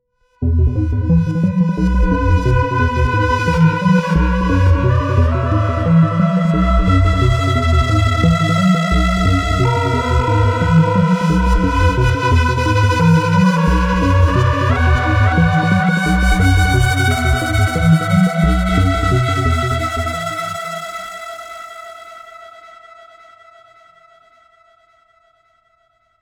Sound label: Baliset Double Track